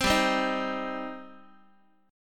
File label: G Suspended 4th Sharp 5th, Guitar; Gsus4#5 strummed; JGuitar